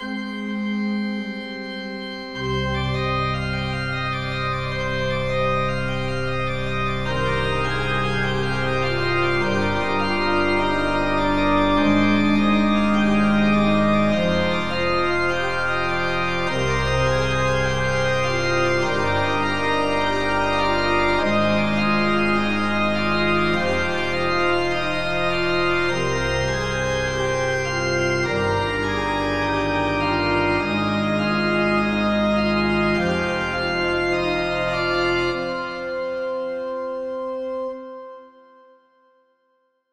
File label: pipe organ